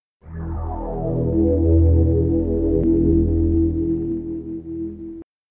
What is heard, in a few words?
Collect Bonus; demolition man; williams pinball